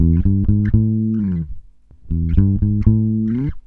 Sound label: BASSE, Roots Disco; USB Soundscan vol.32; AKAI